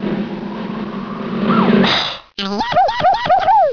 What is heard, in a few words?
Logo Sound; Humongous